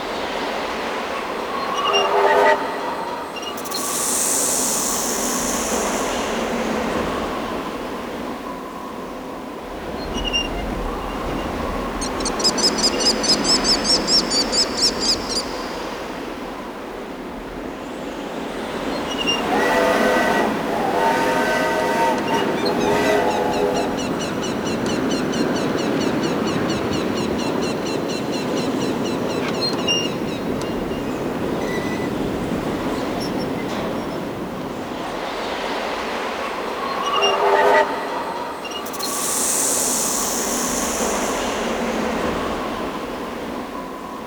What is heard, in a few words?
Ambient